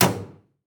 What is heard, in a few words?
copier storage door close